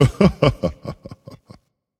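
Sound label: "heh heh", nonverbal; smite; khepri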